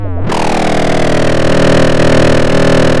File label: pop, chainsaw